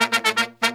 Pop & Funk Brass, 120

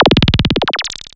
SYN FX; X-Static Goldmine 1

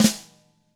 DW FLAM, DW KIT; ILIO; Double Platinum Drums 1

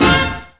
Brass Hit; Proteus 2000; Digital Sound Factory Proteus 2000

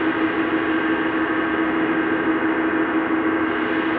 sparse soundscape samples; ReLooped

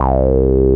A BASS; CMP - Analogon

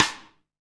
SD rim; Sound & Vision - Gigapack I

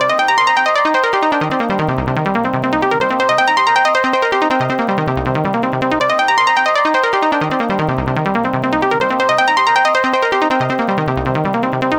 gro 160, Hardcore Loops, Arpeggios; Dance Synths

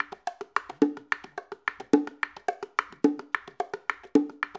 loop, Percussion Slam; InVision Interactive

